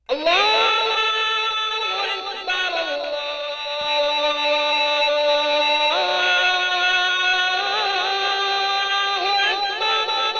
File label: Allah, This is the sound my alarm clock makes every morning. It is the beginning of the Turkish c